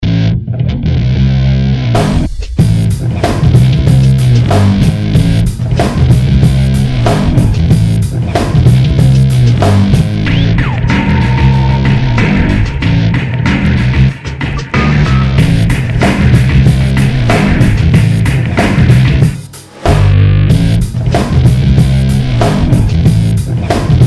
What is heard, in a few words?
rock, royalty-free song